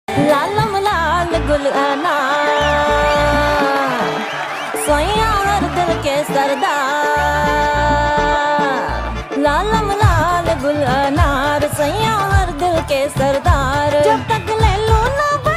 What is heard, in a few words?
bgm, alarm ringtone; kaagaz movie; pankaj tripathi